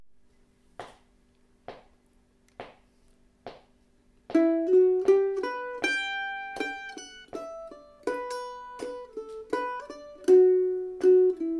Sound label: Gentle waltz lent